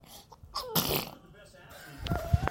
sneeze

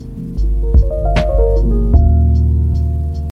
Lofi piano riffs

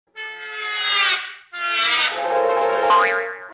Cool Sms Tone; Jerry

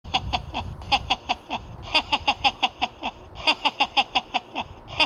hehehe

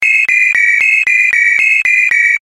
Incoming Call

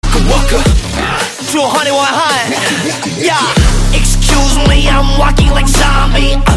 zombie

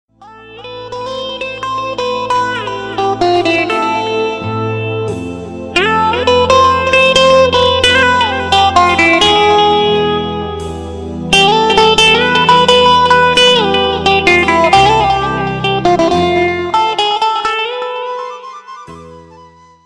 Awesome Guitar

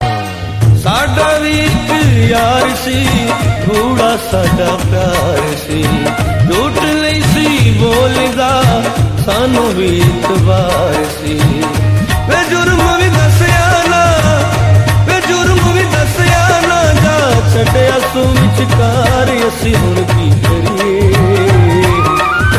Punjabi

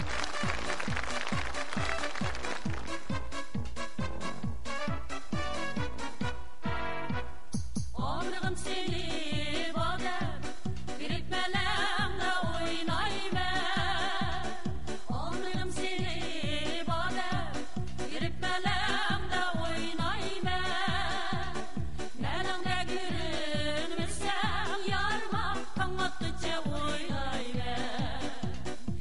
Uyghur Music

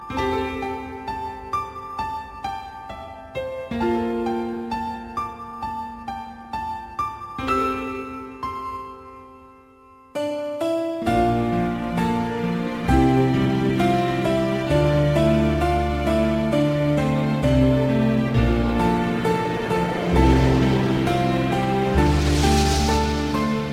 Piano

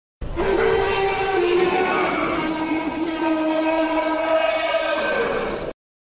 Alien Noise; Super 8

